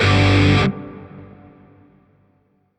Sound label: Shawzin Pent Maj Chord G; Warframe; Grineer Shawzin